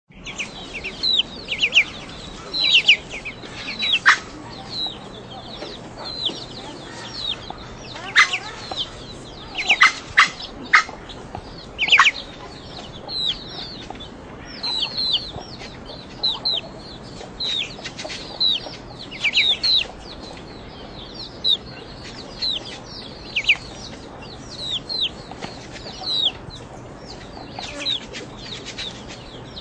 Far East Country Birds, Country birds (Ducks, chicks, chickens; Country birds (Ducks, chicks, chickens, pigeons in a quiet farmyard/country setting